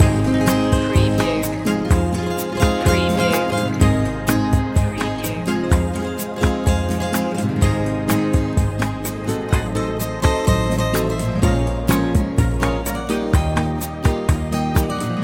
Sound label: calypso night loop